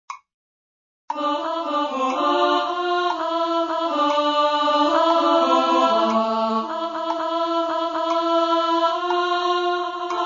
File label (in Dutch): alle stemmen